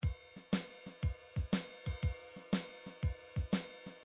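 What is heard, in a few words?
midi drums, blues shuffle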